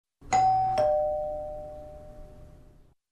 Doorbell single

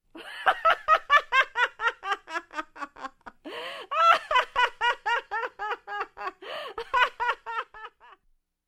Woman laughing hysterically

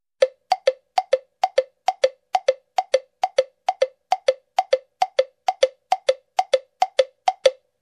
Woodblock triplet rhythm

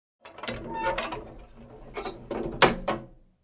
Closing steel compartment

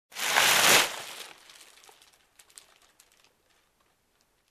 Garbage Bag

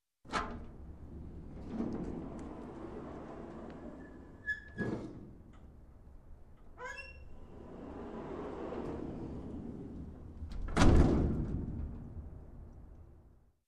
Sliding Creaky Door open close

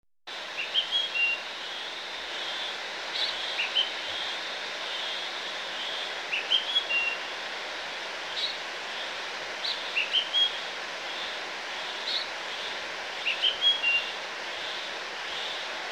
Stream burbles with birds chirping

